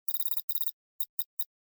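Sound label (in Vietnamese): Âm thanh đầu vào thông tin dữ liệu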